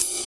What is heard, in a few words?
Ride; Equipped Music; Loungin House